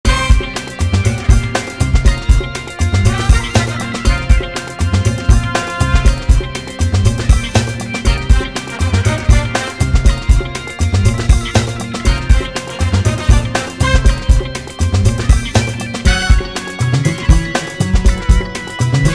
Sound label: funk